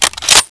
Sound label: Enfield bolt back; dod